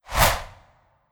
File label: Impaler Earth; Legend of Keepers (gamerip) (2019)